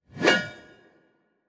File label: Metal; Engineer